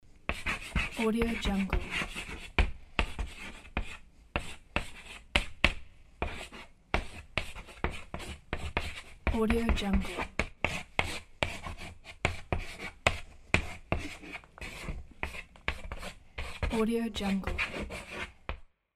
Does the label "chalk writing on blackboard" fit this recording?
Yes